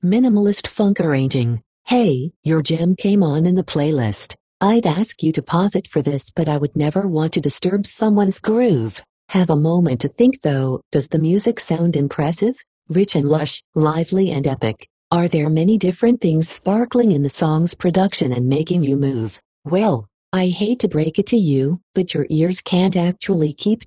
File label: MINIMALIST FUNK ARRANGING